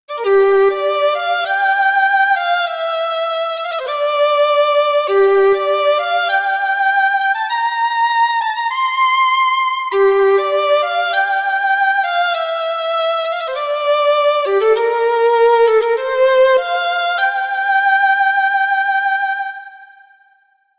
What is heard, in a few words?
motif violin wet